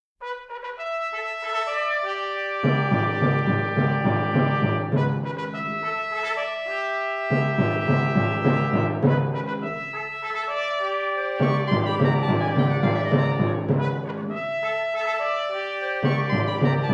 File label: menacing fanfare